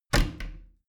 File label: bathroom cabinet both close heavy; objekte; tueren